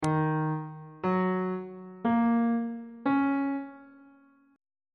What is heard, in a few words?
d sharp dorian 7th chord 1 is d sharp minor 7th on bass clef